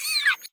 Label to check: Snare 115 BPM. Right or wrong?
Wrong